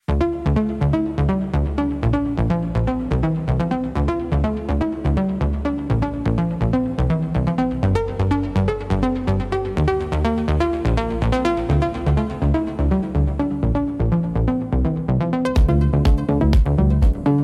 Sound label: Electronic Impulse